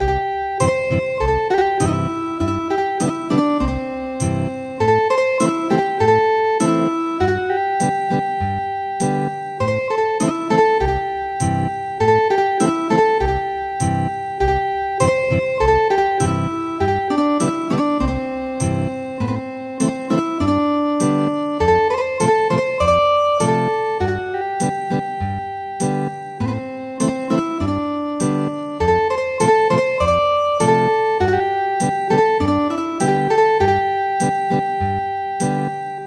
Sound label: Ethnic Guitar, traditional; The Melody of Purple Bamboo